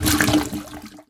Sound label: gas can fill interrupt; player; source engine; left 4 dead 2